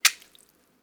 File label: Footsteps Puddle One